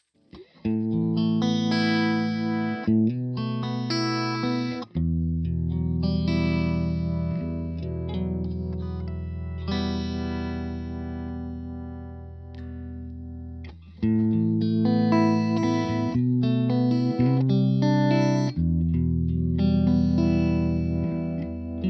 Phase Vibe Mix Fat

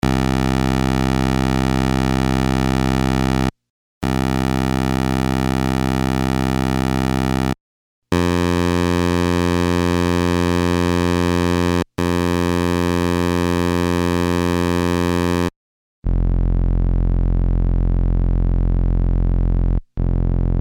RS 505 vs Xils 505 Comparison

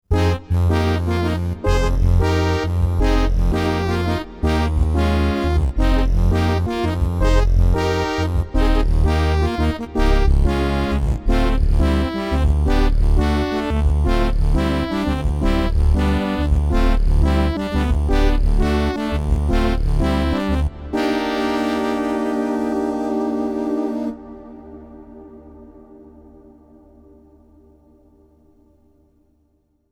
PPG Wave Computer 360 Brass

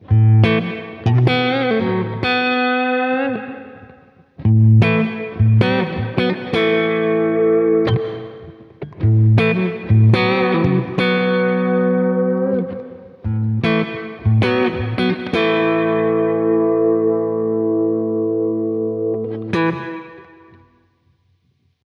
Prussian Blue Reverb; 12 OClock

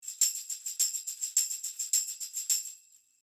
Beyer M70 Pro X Tambourin 1 Meter Behind 180

